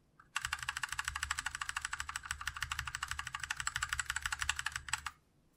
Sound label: Kailh BOX Brown ESC; SGK50 S4